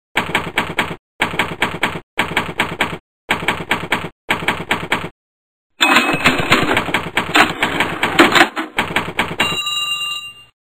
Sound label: Typewriter